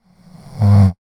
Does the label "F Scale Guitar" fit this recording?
No